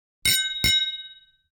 Dining room dinner glass cheers

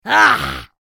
Mobile game character pain; video game

